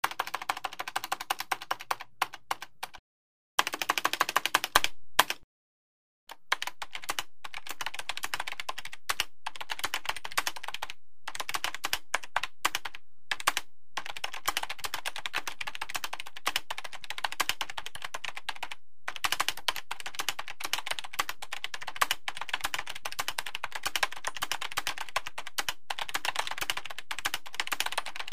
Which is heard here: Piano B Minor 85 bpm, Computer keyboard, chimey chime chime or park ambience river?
Computer keyboard